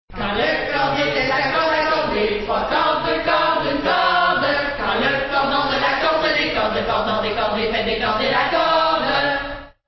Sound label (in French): chanson à la corde